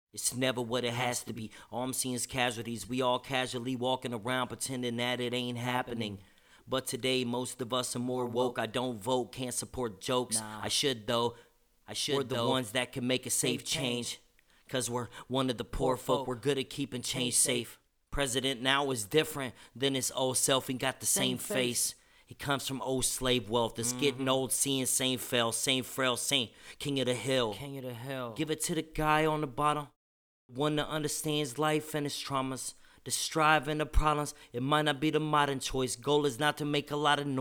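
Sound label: hip hop 92 D, acapella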